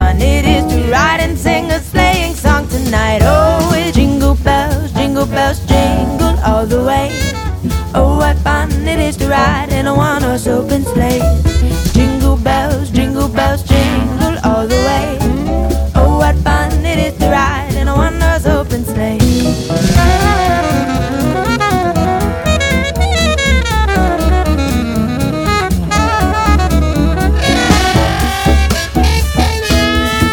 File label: jingle bells